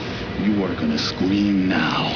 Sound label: scream